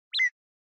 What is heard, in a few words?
Short Accent High